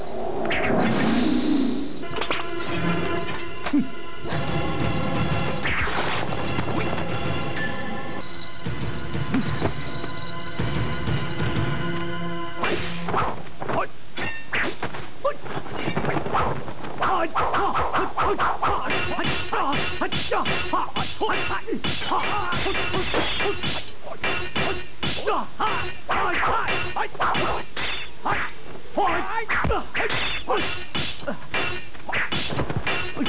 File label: Fight; 特酷声音素材库